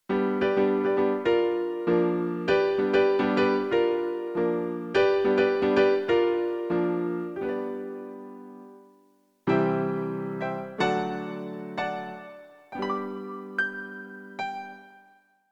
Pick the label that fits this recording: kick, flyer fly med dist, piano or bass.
piano